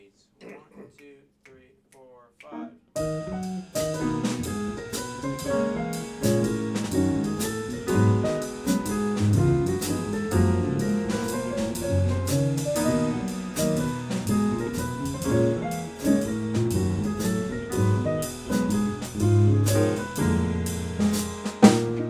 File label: jazz